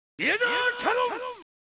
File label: attack